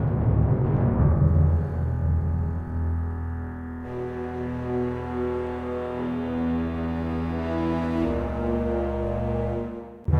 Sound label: Ambient Theme